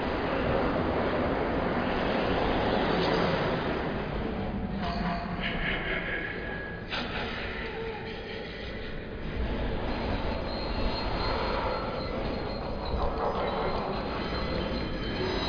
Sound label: Music Ambient; nosferatu the wrath of malachi